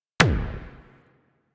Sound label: Arch Gun Primary Beam Punch 3D, Weapons; Warframe; Archwing; Hildryn